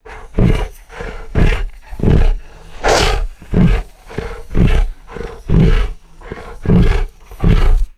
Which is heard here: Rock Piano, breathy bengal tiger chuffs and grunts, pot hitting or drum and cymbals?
breathy bengal tiger chuffs and grunts